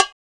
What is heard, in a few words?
POP BGO SLP, BONGOS; Drums In Your FACE!